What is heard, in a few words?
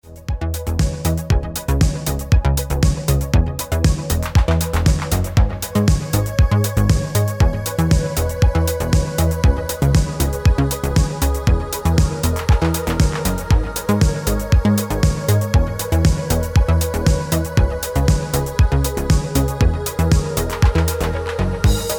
Ringtone